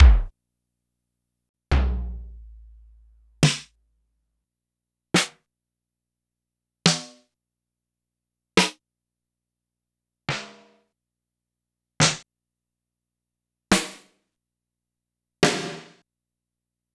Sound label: Korg N5 Street Kit slices